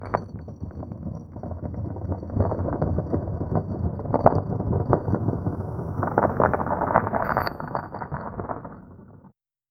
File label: sound effect of a, rock